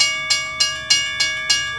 prejezd bimbam; Mafie 1

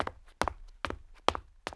dobeh; Mafie 1

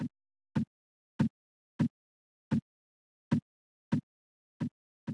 nervos; Paulie; Mafie 1 cz

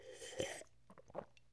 piti; Mafie 1

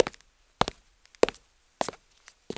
kroky; Mafie 1

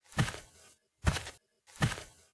po trávě; Mafie 1

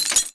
sklo krok; Mafie 1

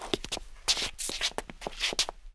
zmatek; Mafie 1

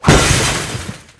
sklo rana; Mafie 1

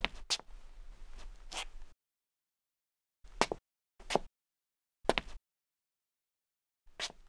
kroky kněz; Mafie 1

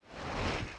posunutí; Mafie 1

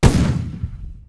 výstřel; Mafie 1

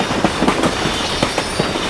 vagonky skripani; Mafie 1

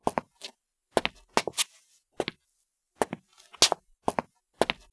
kroky; Mafie 1

